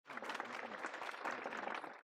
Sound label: reactions applause; jiti meet